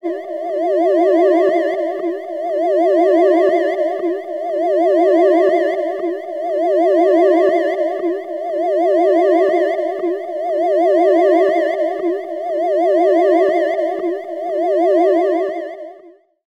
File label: science fiction eerie science fiction alarm